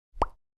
Bubbles Sound

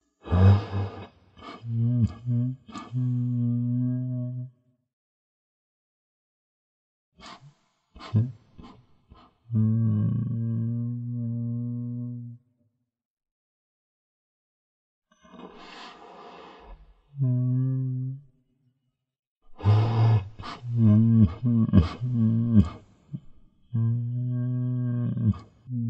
ghost crying sound

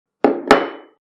cup on table sound; صدای استکان، فنجان و نعلبکی

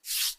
Slide; transition

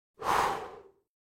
Blow Sound Human Blow Candle

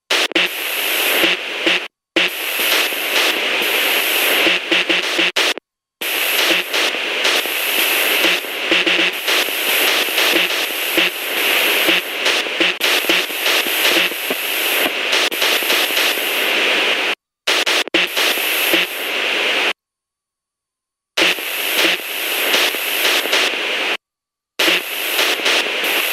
tv noise; ambience

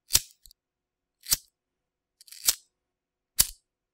lighter

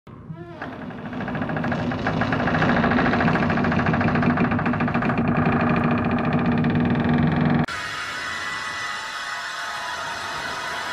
horror sound effects

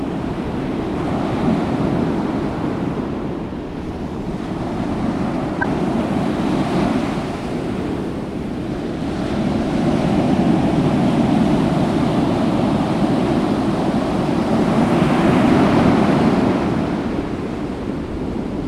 Ocean Waves; beach sea waves; Mike Koenig

